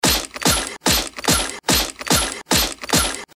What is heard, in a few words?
machinery loop